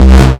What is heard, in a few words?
Bass C, Offbeat Bass